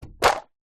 wood hardboard panel thin set down flat concrete ground